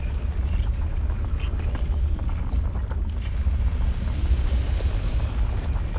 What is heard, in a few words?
hive asteroid idle; Stellaris; guardians